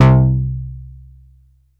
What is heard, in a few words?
Bass C2, Prophet Buzz Bass; Oldies